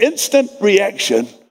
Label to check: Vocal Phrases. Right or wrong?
Right